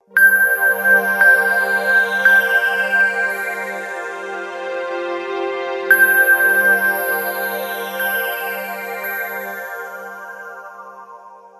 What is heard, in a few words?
nokia ringtone